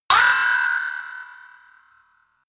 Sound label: pitched rattler